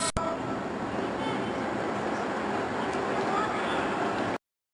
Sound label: swainsons thrush; fine arts